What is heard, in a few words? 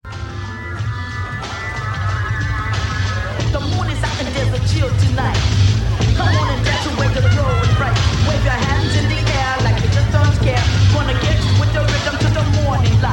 rap; bacchae